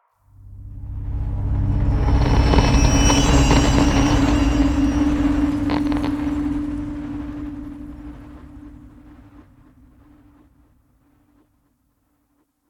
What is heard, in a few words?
Cave Head Display Static; Ambience; Warframe; Stalker Cave